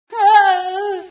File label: scream, female sci